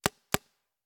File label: Paintball Gun; Sound Ideas; Guns